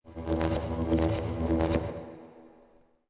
Goodyhut Electric; Ambient; Sid Meier's Civilization 4 Complete; Beyond the Sword

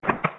Mech Crunch; Sid Meier's Civilization 4 Complete; Beyond the Sword; Units

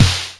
snare; Tank

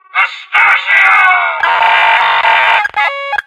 strength break machine; Left 4 Dead 2; moustachio

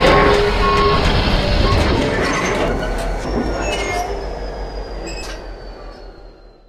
train brake; Left 4 Dead 2

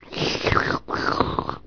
leech suck; Emperor - Battle for Dune

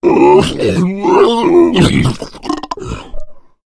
male boomer lurk; voice; idle; Left 4 Dead 2

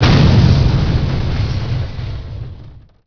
explosion medium; Emperor - Battle for Dune

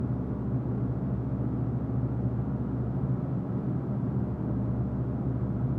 dust indoors; Counter-Strike Global Offensive

